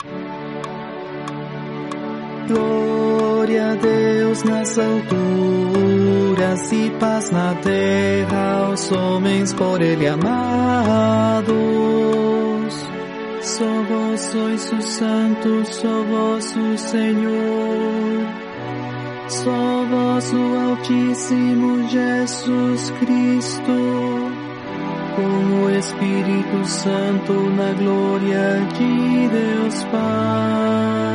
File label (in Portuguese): tenor